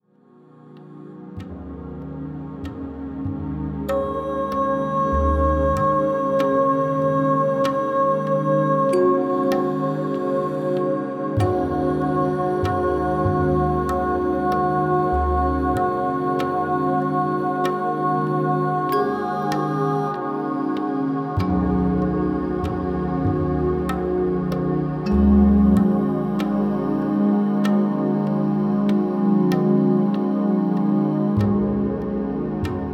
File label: Glockenspiel